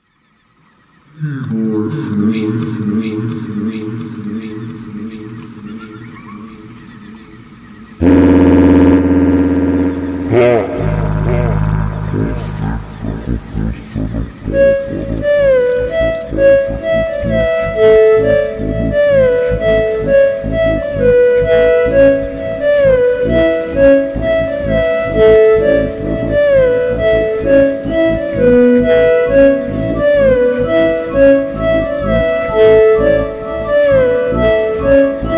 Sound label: Montagem hyper Slowed